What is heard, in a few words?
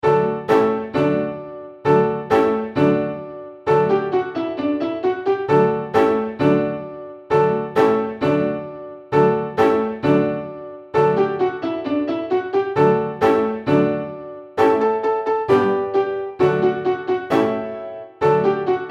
Hot Cross Buns Song